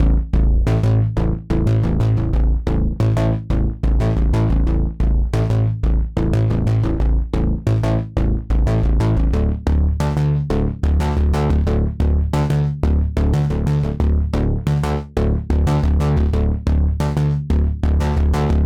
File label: Poly Bass